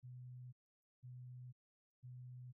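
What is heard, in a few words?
puretone 125 Hz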